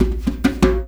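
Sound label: HAND DRUM; Spectrasonics; Heart of Africa 1; HAND DRMS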